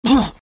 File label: pain sharp; garrysmod; tf2-content-gmodcontent